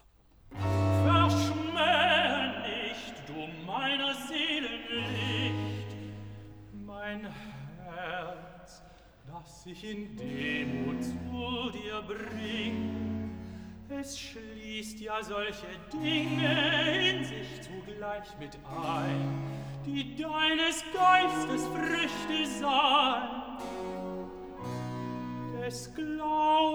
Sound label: Cantata, Recitativo (Tenor); Epiphany; BWV 65 'Sie werden aus Saba alle kommen'; V. Recitativo (Tenor) 'Versmähe nicht'; Bach; Weimar; Leipzig